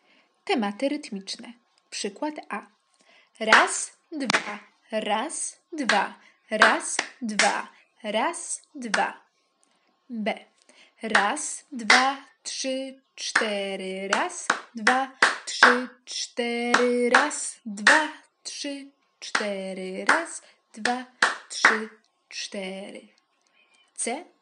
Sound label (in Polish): rytmika